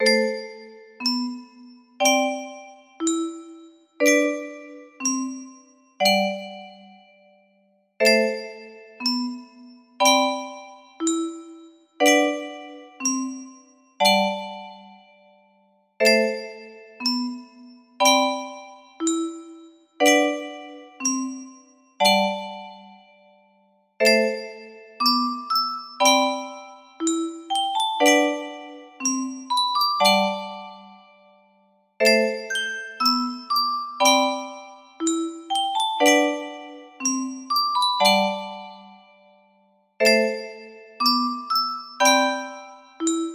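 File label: music box melody; minecraft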